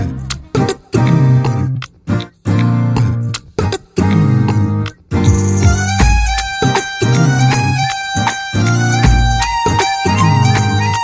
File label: BG Tone